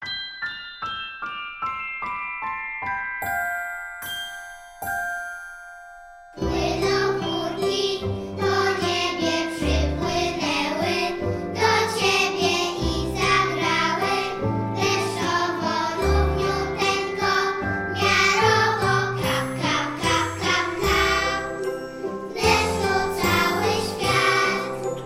wersja wokalna glosy dzieci

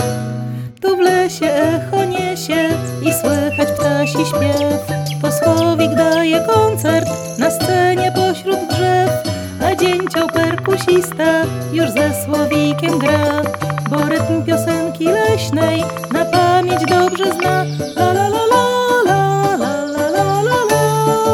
TARANTELLA z glosem nauczyciela

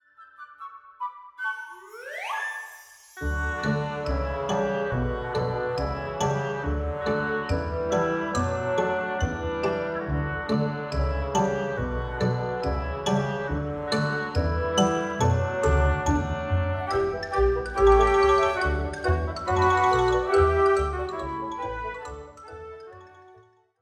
piosenka wersja instr

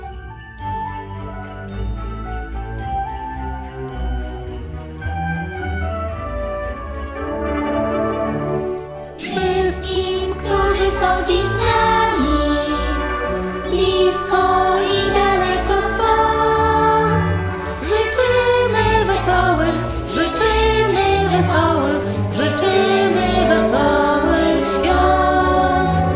wersja wokalna

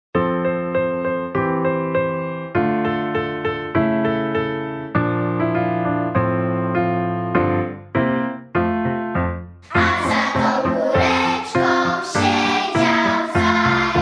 wokal dzieci z fortepianem

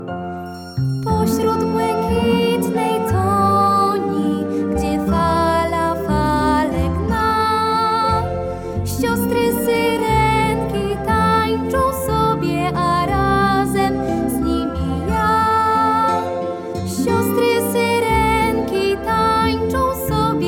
wersja wokalna

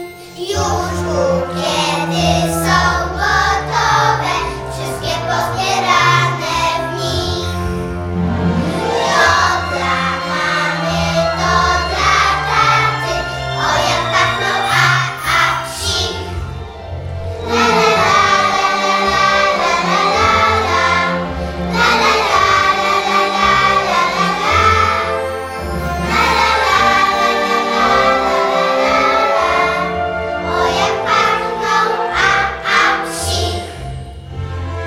wersja wokalna z glosem dzieci

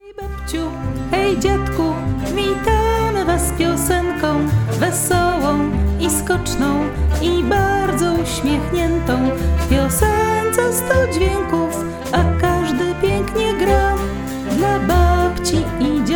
wersja wokalna

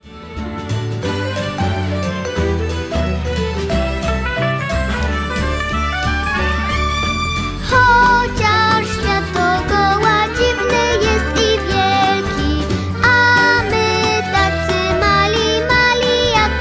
wersja wokalna

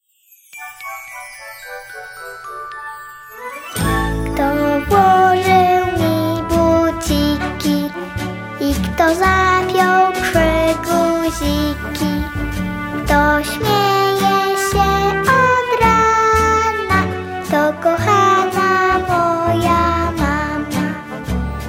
wersja wokalna

